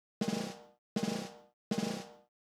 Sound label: Snare Roll; hydrogen